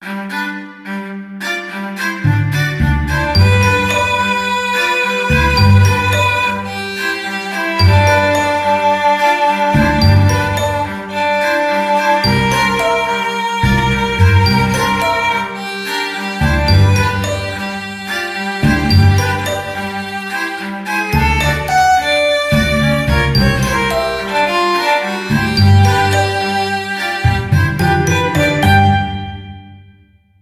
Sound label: Classical Blues; Huawei; Honor 3X Pro; ringtones